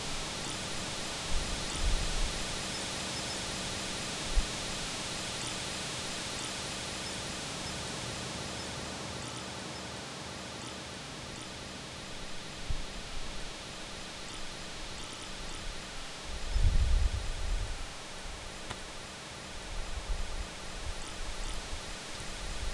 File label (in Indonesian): angin lembut di pepohonan